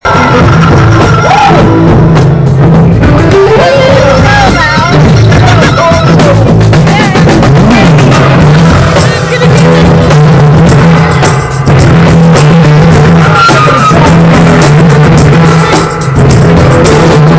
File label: soul funk in progress